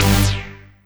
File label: Synth Hit G